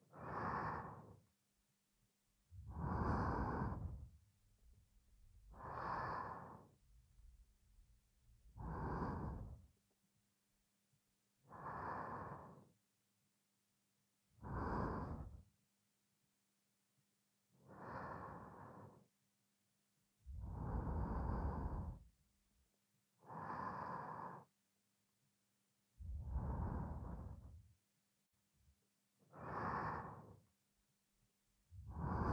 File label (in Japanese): 寝息ループ 右; 同居大姐姐; 陪睡; 擅长娇宠和治愈的同居大姐姐